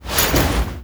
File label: grunt foley drastic